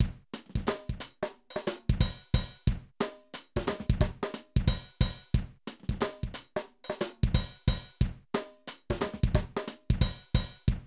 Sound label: Drum loops, 70s funk